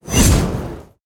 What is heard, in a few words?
Sword Fire